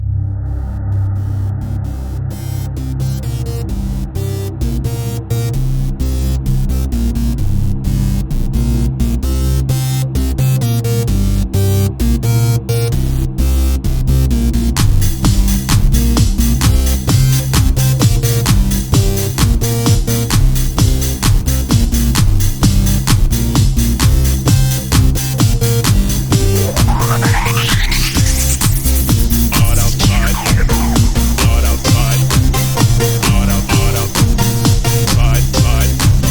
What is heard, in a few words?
Disco House Remix; Pony Music Archive